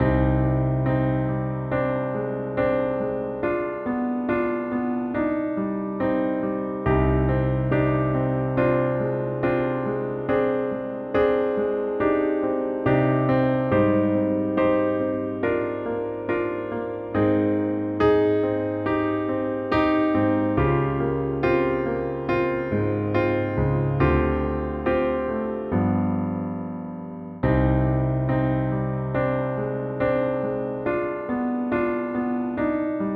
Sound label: BGM Game Over